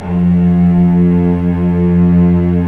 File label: STR VC3, Vcs3 mf vb; String Master Series